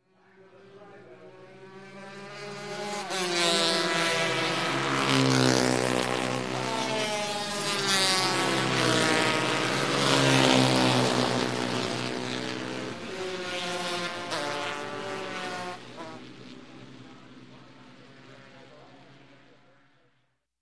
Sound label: Motorcycle, Race 125cc Pass By; Sound Ideas; Series 2000